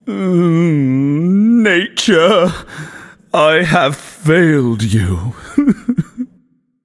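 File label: non verbal boohoo, voice; cernunnos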